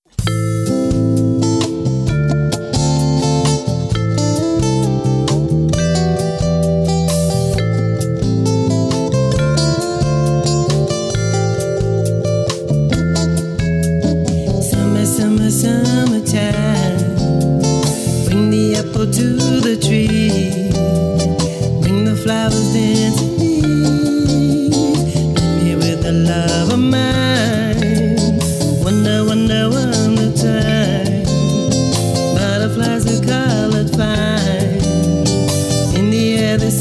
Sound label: jazz